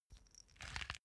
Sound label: small pepper grinder glass ringing; industrie-wirtschaft; gastronomie